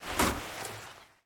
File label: Open Door; Game rip; MiSide